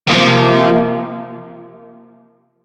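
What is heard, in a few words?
Shawzin Pentatonic Minor Chord I; Music; Warframe; Narmer Shawzin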